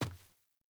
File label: Sandals Land Dirt; Characters; Player; Footsteps